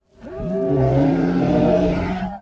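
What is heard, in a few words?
howler monkey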